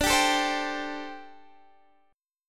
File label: D 7th Suspended 4th, D, down, Guitar Open D